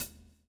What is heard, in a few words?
SWNG